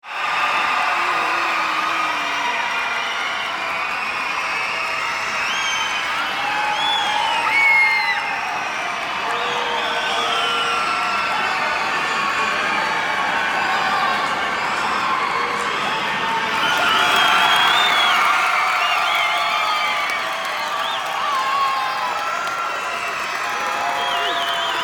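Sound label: loud crowd scream; Негодование сборища людей; Негодование сборища людей для монтажа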